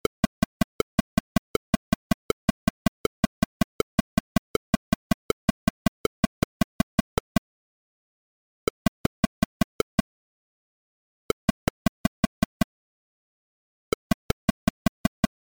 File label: Click follow meter OFF